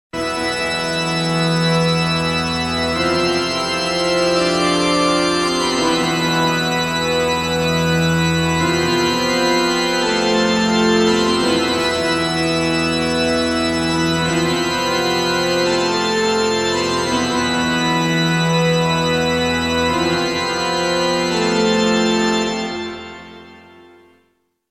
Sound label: Death; Exile: Wicked Phenomenon; Exile Janen no Jisho; TurboGrafx-16